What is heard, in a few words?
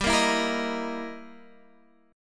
E Minor Major 7th Flat 5th, G